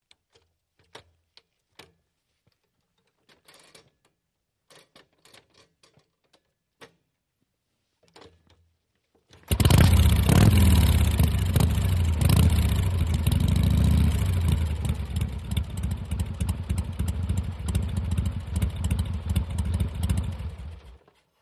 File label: M Cycle Harley Kick St